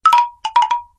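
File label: smesnoe sms na nokia, funny sms on nokia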